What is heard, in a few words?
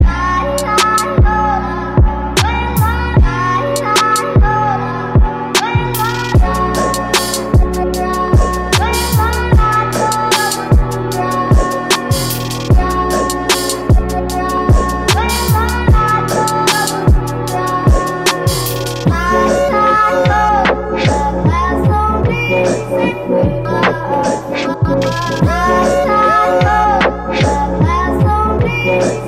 trap yodeling edm; yodeling walmart kid